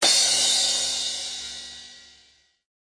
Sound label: China Crash, DrumKit; REAL LIVE KITS; Osama Qureshi DrumKit